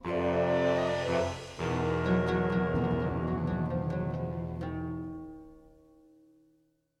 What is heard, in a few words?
Death Stinger; Sly Cooper Thieves in Time PS Vita PS3 2013